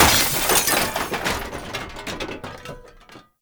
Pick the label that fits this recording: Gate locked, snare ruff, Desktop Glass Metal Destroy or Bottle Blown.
Desktop Glass Metal Destroy